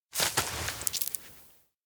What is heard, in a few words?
soybeans pick; anemoi